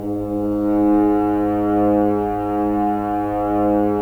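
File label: Solo French Horn, Brass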